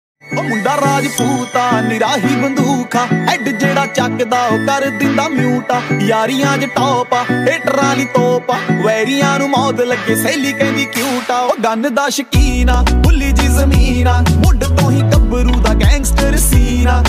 Punjabi Ringtone

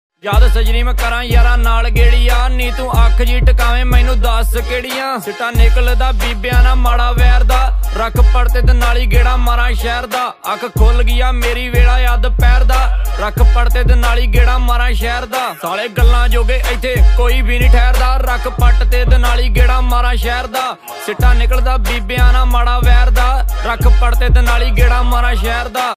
DJ Punjabi Ringtone

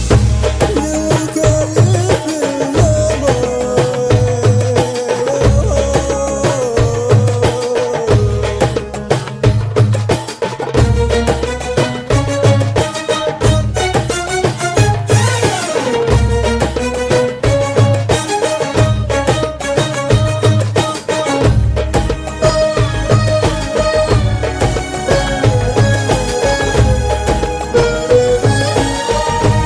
Drum Beats, Telugu Ringtone

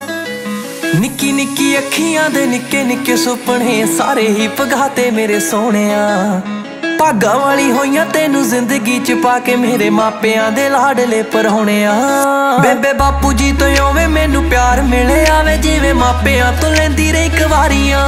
Jatt Punjabi Ringtone

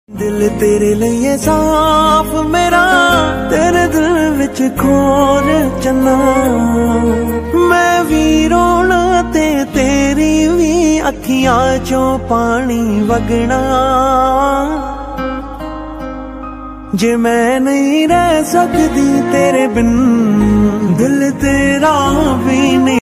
Dance Punjabi Ringtone

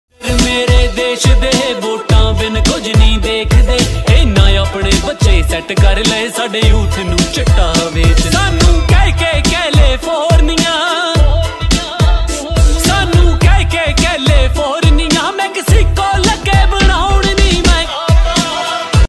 Desi Punjabi Ringtone

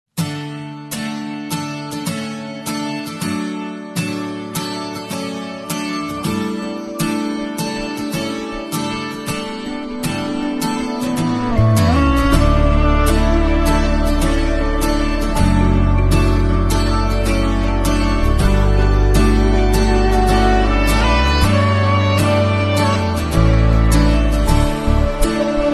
Instrumental

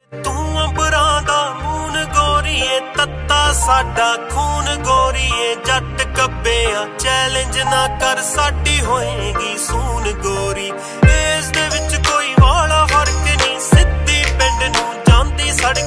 Punjabi Ringtone

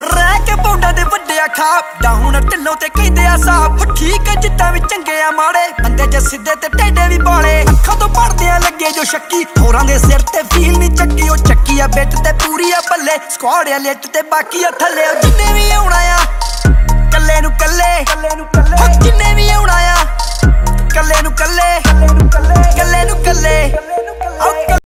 Dance Punjabi Ringtone

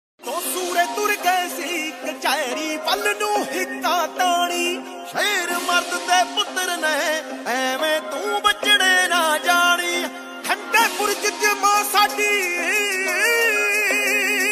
Desi Punjabi Ringtone

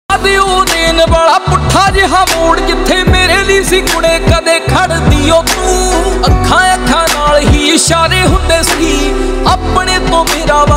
Desi Punjabi Ringtone

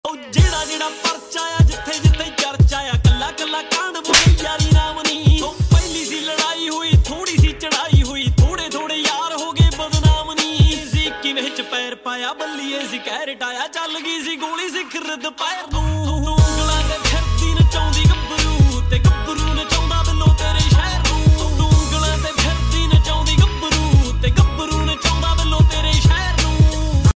Dance Punjabi Ringtone

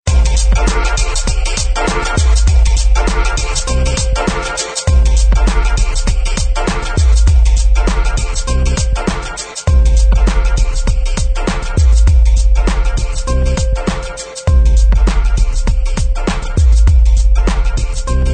Calm Techno Ringtone

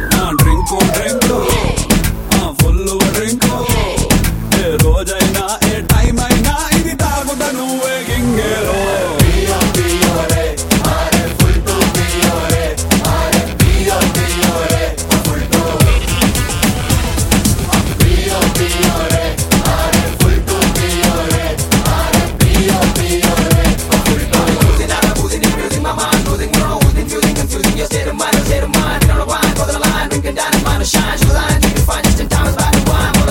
Telugu Ringtone

